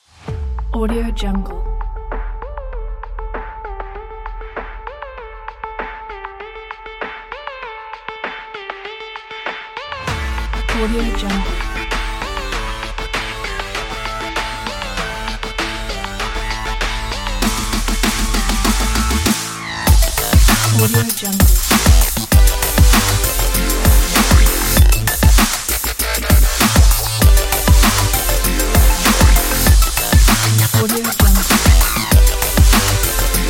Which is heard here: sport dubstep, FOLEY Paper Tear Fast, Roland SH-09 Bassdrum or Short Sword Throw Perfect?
sport dubstep